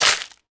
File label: Household Shaker, household rhythm samples Hits